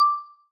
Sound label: begin video record; Apple iPhone-3GS